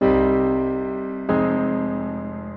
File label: 186 two piano chords; KEYS ORGAN PIANO LOOPS